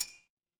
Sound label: glass glass tap